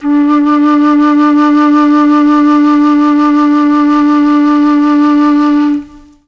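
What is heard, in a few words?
D2 chamberlin flute, Mellotron; CHMBLN FLUTE; Mike Pinder - Mellotron Archive CD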